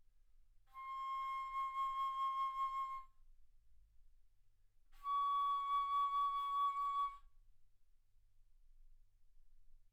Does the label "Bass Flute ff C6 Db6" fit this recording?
Yes